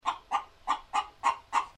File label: chicken